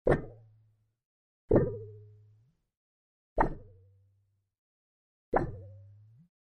Cartoony Effect Stereo High, High Pitched Stereo Cartoony Effects; 4 Takes; Cartoon Sound Design